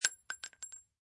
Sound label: Bullet Shell Drop Machine Gun Cement, Machine gun empty shells fall on cem; Impact